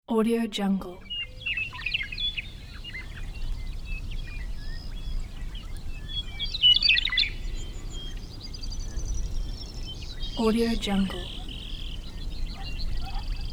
birds song

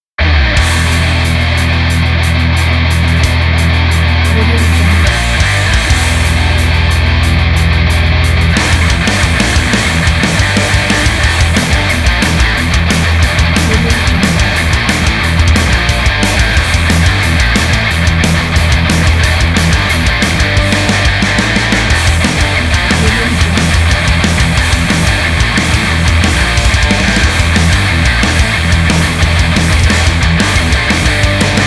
powerful aggressive metal

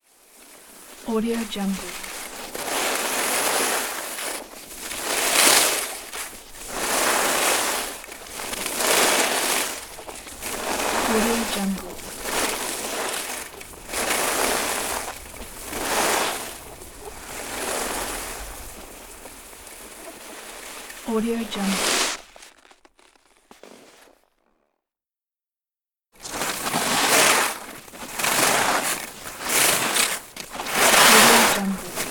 skiing downhill

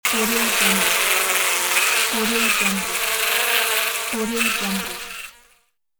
bee buzzing

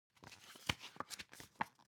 object paper magazine handle

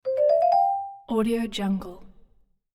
short clavichord major ascend; hollywoodedge

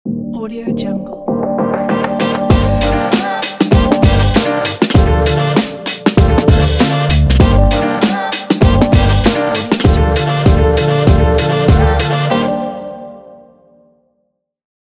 podcast intro logo

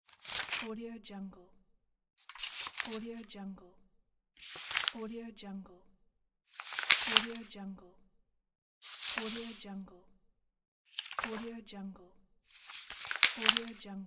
mail quick opening

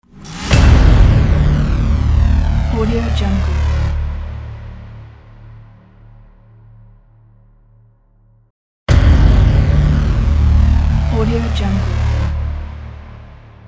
inception impact hit

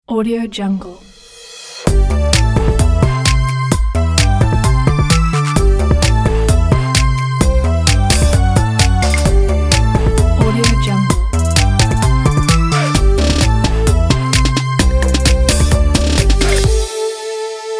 fun electronic logo

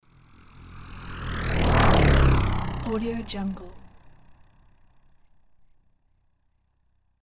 rattling car driveby

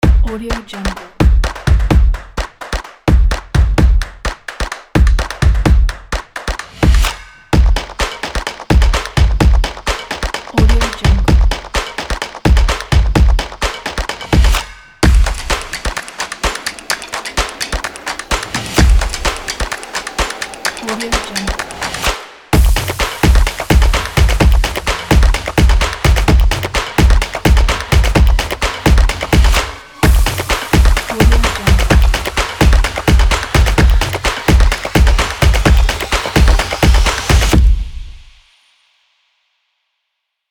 drum and clap

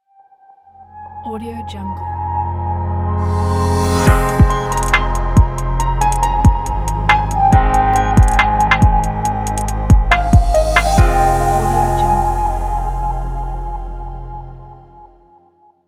future logo

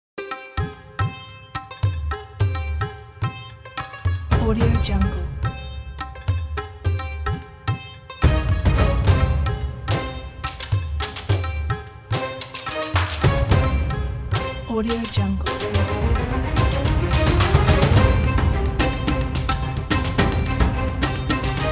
indian epic action; remstunes

